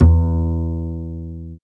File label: E Bass